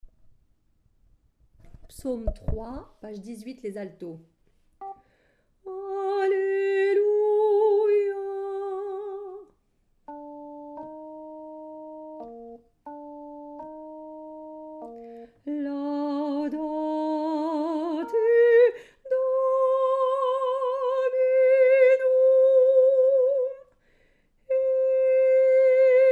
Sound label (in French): psaume Alto